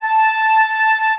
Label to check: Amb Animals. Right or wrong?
Wrong